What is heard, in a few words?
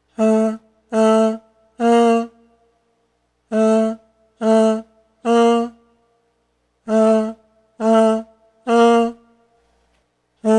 voice